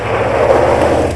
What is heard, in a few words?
skid, 汽车; 汽车声音素材